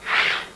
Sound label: slide; CHECKERMANIA 1.04